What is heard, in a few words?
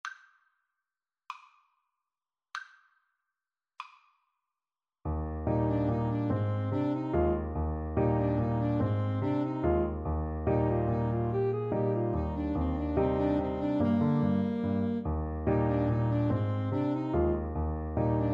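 Alto Saxophone Duet, karaoke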